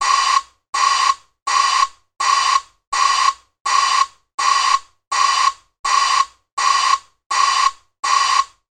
van reversing tone short iveco; transportation; sound effects

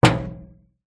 impact metal thud inside microwave oven

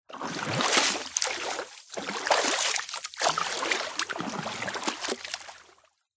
nature water med shallow movement slosh

